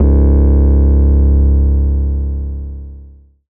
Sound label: 808 Bass Phone Db3; Slate 808 Deep Dive; SD Signature 808s